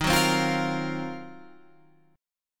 D sharp Minor 6th Add 9th D sharp Guitar Open F, chord; strummed